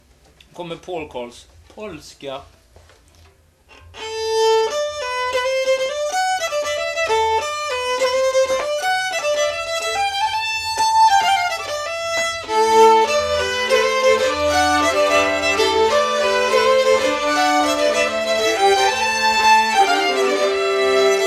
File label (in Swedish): Pål-Carls polska